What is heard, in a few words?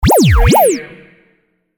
button